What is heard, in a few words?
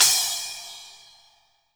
splash, virtual drum sounds